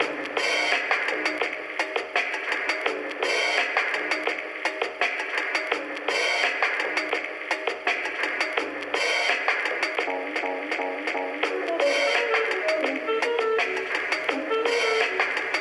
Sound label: Music Rooftop Loop; Subway Surfers Tag